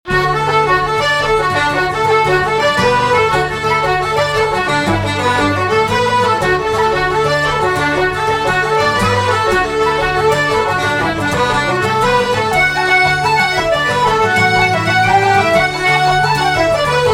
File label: Slip Jig; Ryans Slip Jig